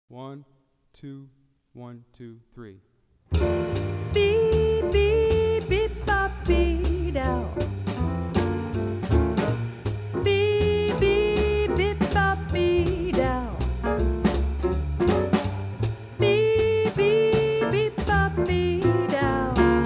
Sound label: Groove Blues, Saxophone; Jazz Conception For Saxophone 21 Solo Etudes (Eb Saxo)